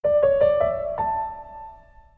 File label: Piano; Shell Oil